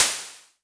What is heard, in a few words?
Crashes; LIMAT Div Dept; Drums